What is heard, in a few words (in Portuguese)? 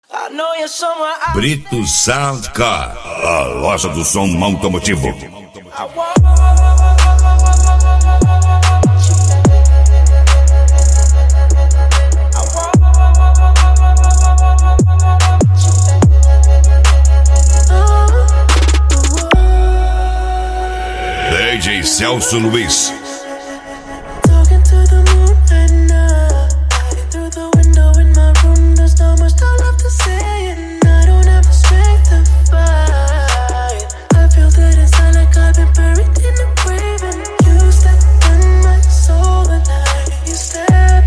sound car, megafunk; cd brito sound car